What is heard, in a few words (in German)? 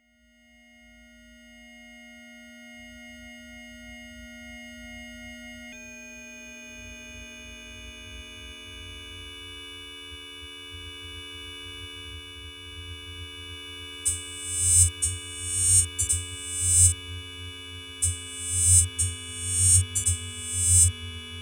Resonanzfrequenz; subharmonisch